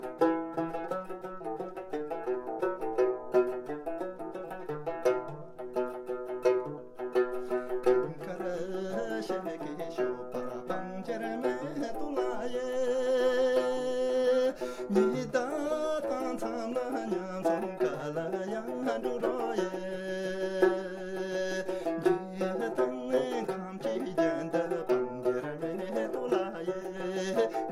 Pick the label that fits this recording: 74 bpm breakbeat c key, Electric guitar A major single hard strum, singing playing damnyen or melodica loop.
singing playing damnyen